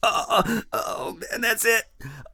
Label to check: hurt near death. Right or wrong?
Right